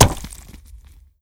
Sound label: pumpkin stuck; weapons